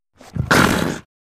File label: Leopard Breath Snort; big cats